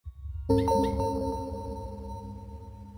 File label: startup; hyper light